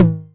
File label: Tom Hi, Novation Drumstation 909